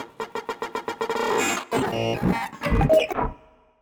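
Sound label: percussion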